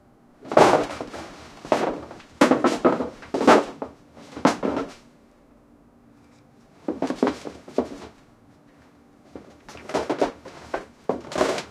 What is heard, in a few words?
scuffle chairs fall punche; chaos